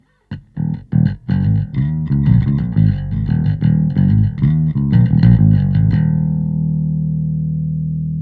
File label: Slap Bass; bass preset